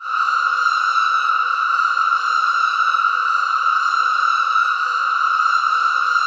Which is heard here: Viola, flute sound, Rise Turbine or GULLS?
GULLS